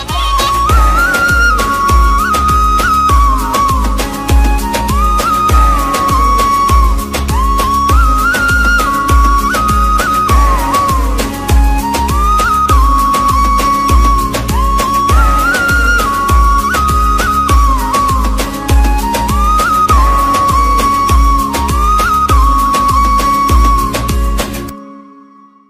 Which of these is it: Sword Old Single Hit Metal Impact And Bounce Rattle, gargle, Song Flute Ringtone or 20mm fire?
Song Flute Ringtone